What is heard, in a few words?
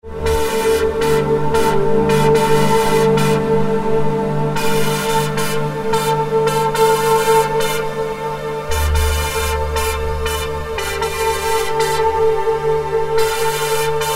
Held Stick Pads